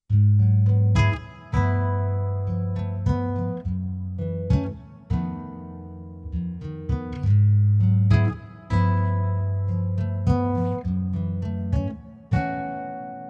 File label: variax nylon